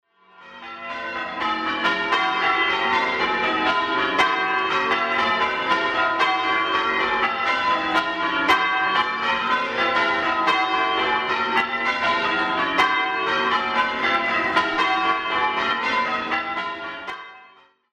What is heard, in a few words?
Ryton Bells